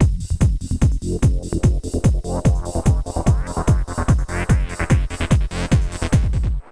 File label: Essential beats